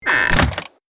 Vampire coffin lid close

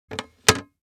Paint can close

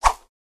Fast whip like swishing whoosh

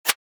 Paintballs flying by fast swish whiz; sport

